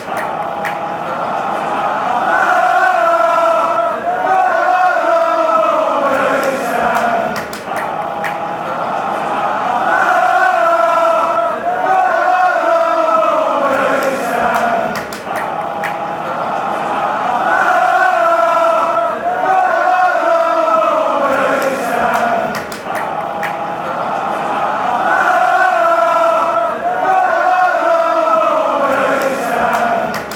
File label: nananana west ham, chants